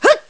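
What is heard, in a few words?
Roll, gameplay sounds; Link rolling; zelda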